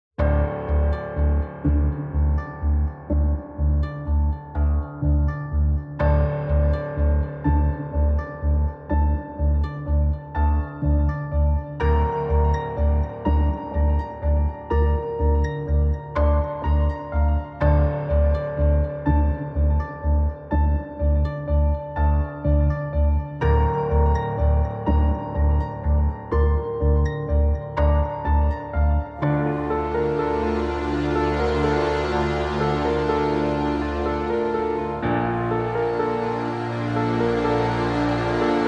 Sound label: Underscore